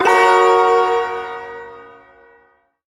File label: Ersatz Shawzin Yo Chord F; Warframe; Duviri